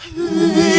BACKWARD, VOCAL

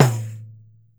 PANDEIRO, Brazil Drum Perc

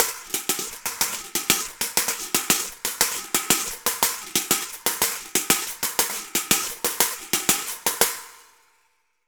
Reco Reco Samba 120 bpm; Best Service; Festa Latina VOL-1

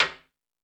Toms 1, drum machine

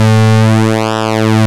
PULSEMOD, ARP Synths; E-MU Formula 4000 Series Vol. 3; Analog Odyssey; ARP Synths II

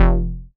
bass F1, Oberheim OB-Xa

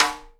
snare JUST RIM, Live Snares; E-MU Producer Series Vol. 8 – Platinum Phatt (CD 1)

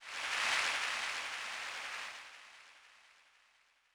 Applau; Yamaha DTXpress-II; FX

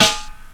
SD, Dance Mega Drums; Best Service; SD-HIP

